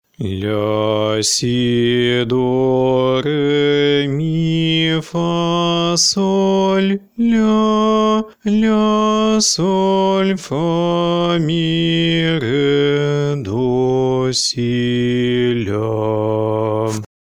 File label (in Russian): гамма ля минор